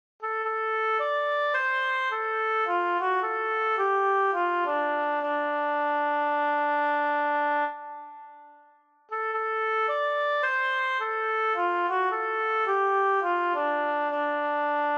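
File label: Oboe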